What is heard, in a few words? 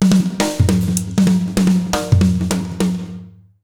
160 B BEAT, Jazz Latin Drumloops; USB Soundscan vol.08; AKAI